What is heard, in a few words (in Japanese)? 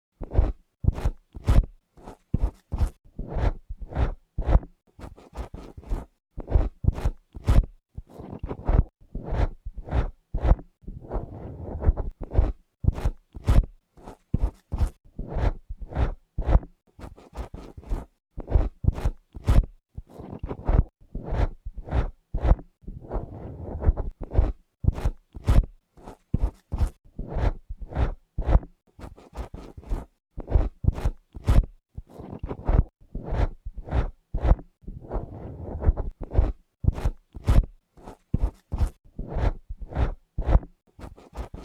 しなやかさと堅さを併せ持った特殊マテリアルが、リズミカルにお耳を刺激する (右); エクスタシー